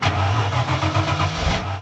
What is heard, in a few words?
start bad; Mafie 1